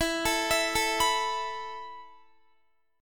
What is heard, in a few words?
E Suspended 4th E down Guitar; arpeggiated; Esus4; 4F,4A,5C,5G,5C,6E